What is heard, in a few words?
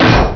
land; QB64-PE; assault